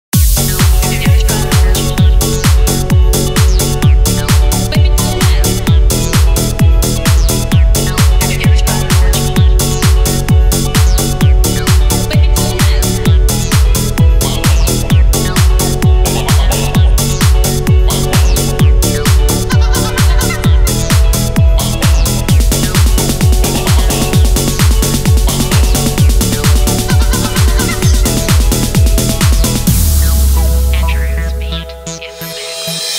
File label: dance mix